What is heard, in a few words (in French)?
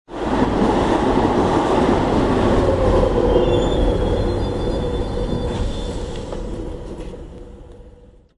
Train